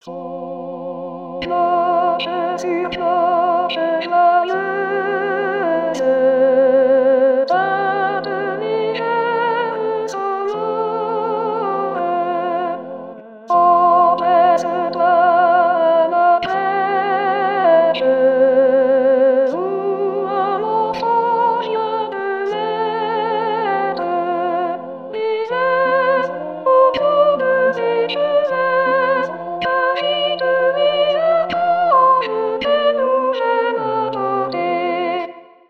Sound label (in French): cloches